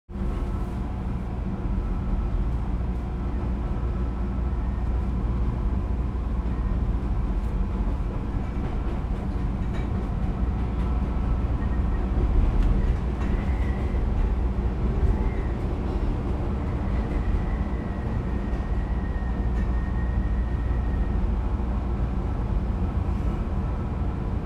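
L TRAIN INTERIOR passenger cabin in motion slow door open at end, Chicago Rapid Transit; The L; Griffin Sound Design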